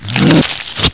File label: bull gore; svencoop; hunger